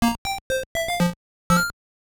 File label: Death; Revenge of Drancon; Game Gear